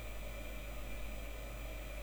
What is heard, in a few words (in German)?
Heizung Fiepen